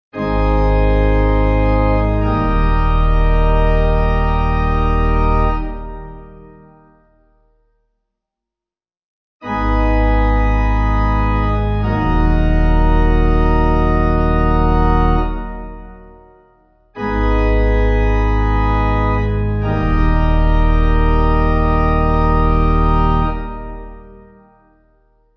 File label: amens simple Eb pipe